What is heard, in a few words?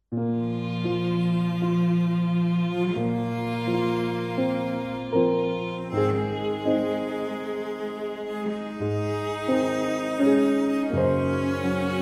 keys violin and cello